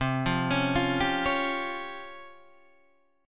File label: Db Major 7th Flat 5th C